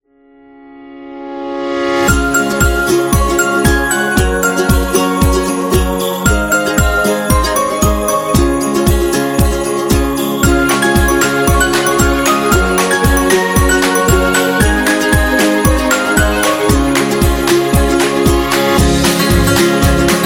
Xmas, merry christmas

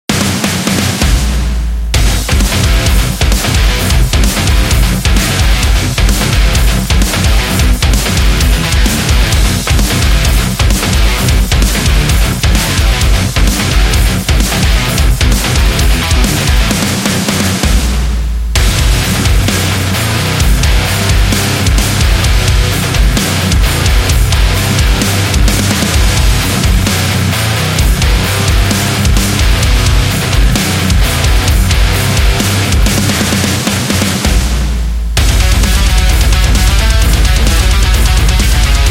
Angry

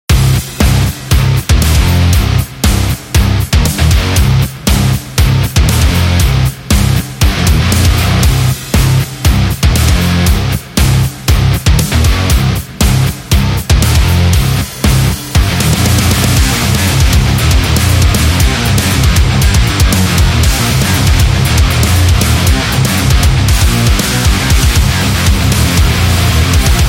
Hard Rocks

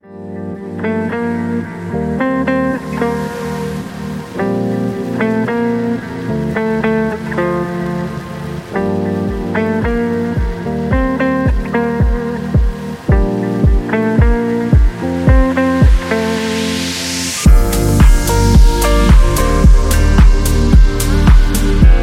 Rhythmic Matrix, house

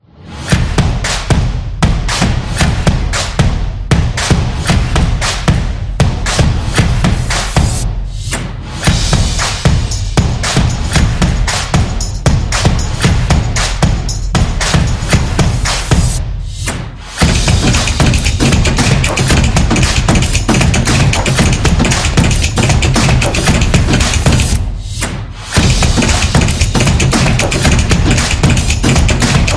Boxing; action sport

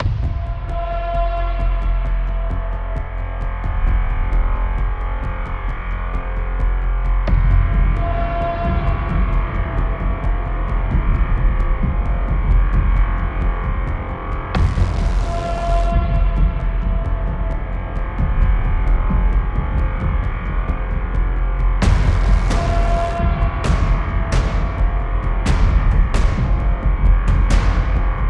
Dark Trailer

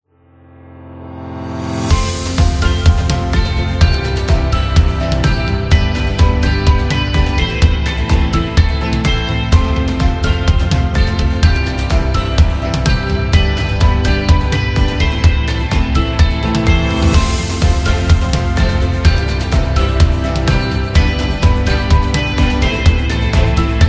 Dynamic Corporate Business Music

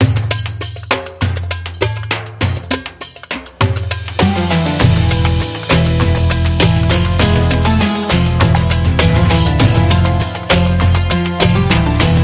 Arabic

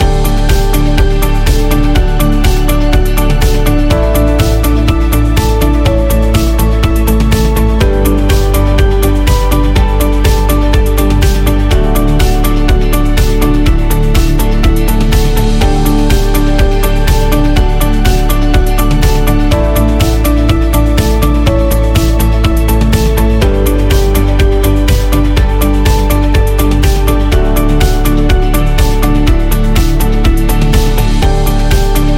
Business Loop Corporate; hi tech

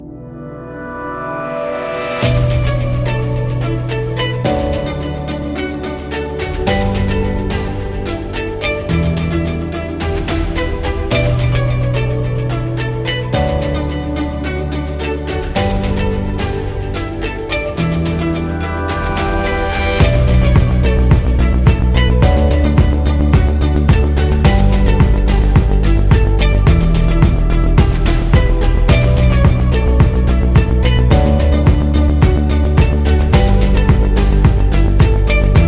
Emotion Corporate

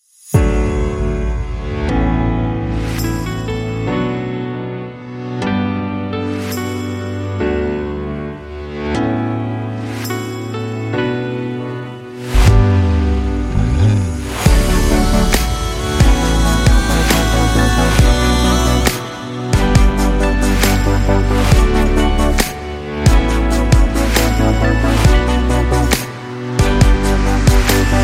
Dream Piano and Guitar; ambient beat

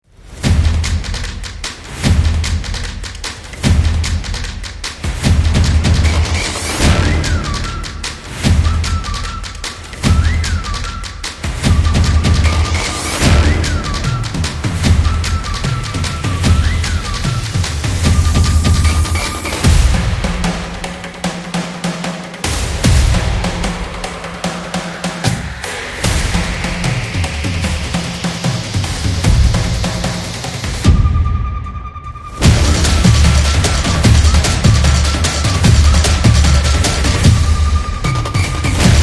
Sport Drums Opener

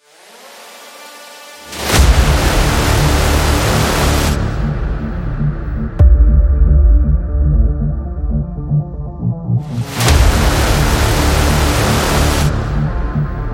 Cinematic Epic Rock Trailer

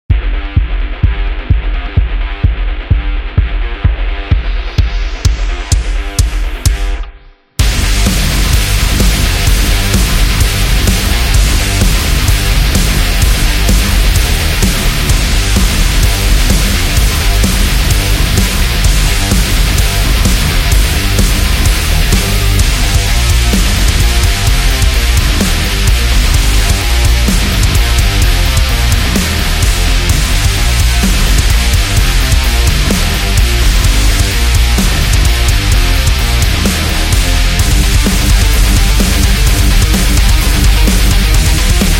Metal Extreme Sports; sports training energy

